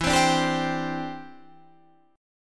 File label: D 7th Suspended 2nd Suspended 4th E